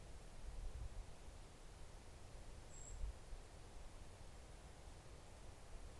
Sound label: bioacoustic observation